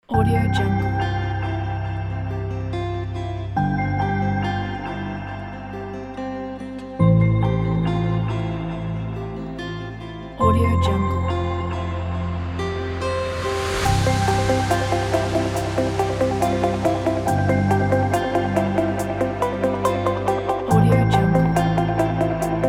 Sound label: clean inspiration